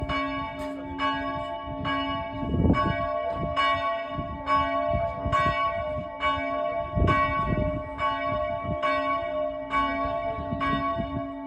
SONNERIE CLOCHE; LEZAY 79; Sonnerie de Cloche(s) avant la messe

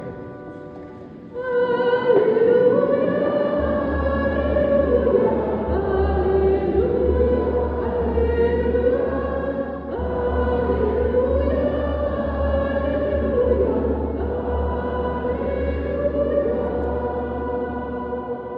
MESSE CHANT ALLELUIA; CHEF BOUTONNE 79; EGLISE NOTRE DAME FETE PAROISSIALE

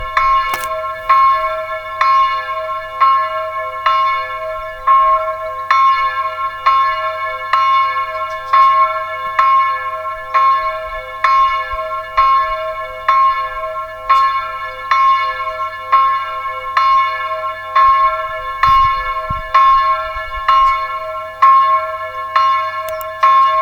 CLOCHES; GOURNAY

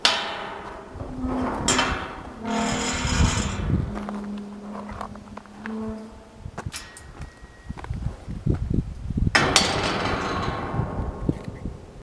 PORTAIL; MAZIERES BERONNE

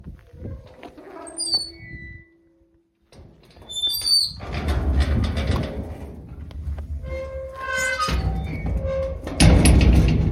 NOUVEAU SORTIE, PORTAILS

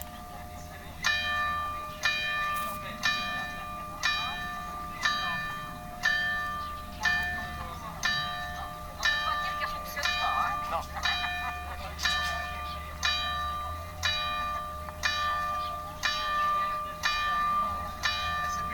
CLOCHE; LES ALLEUDS

